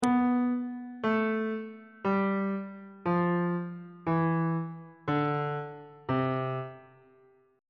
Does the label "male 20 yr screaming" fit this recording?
No